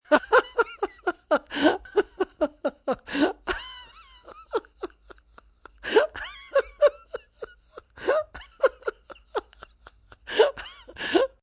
Laugh Light, Laugh, male, lighthearted (human voice); Laughter